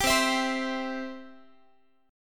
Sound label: C Major, Guitar; strummed; 22,20,19,22,x,x; 4A,4E,5A,5D,5G#,6C#